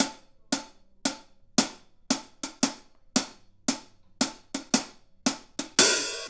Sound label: Hi Hat Pattern